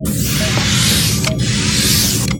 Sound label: borg sound